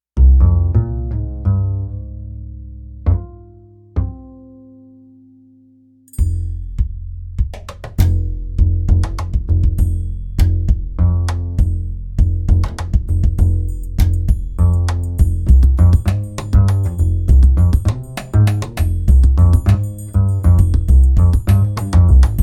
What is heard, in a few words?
the upright